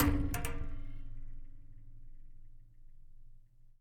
Flick

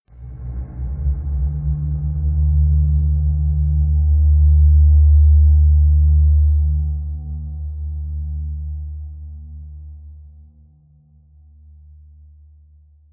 Creepy Bass Horn

